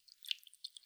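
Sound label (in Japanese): くちゅ 短, 水音効果音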